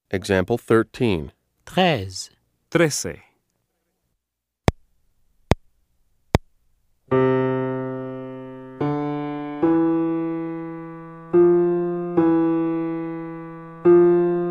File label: Keyboard